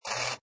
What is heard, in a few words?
Pinball Sound Motor Or Crane Move; Video Game Sound Effects